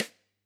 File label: K1 close SdSt, drum